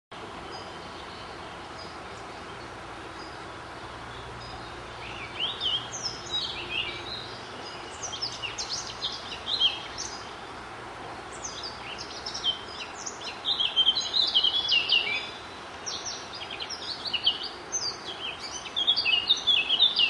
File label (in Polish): kapturka; zakole wawerskie